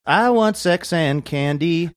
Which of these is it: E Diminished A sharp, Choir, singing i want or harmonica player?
singing i want